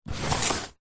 cardboard box parcel heavy slide wood deck external; zapsplat